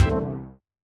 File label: crappy pew; Godot